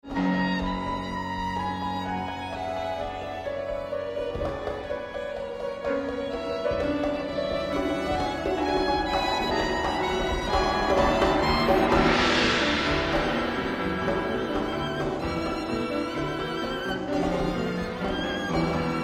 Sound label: raga